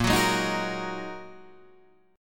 A sharp 7th Suspended 4th Sharp 5th down Guitar Half Step Down